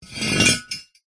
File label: foley metal heavy weight drag scrape solid hard ground